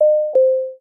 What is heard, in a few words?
alarm